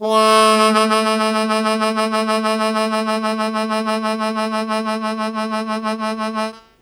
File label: Strong Vib Sustain A0, Harmonica; Zero G